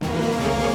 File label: Music Segments Fight Cold Theme Hard 160bpm Start; asterix obelix slap them all 2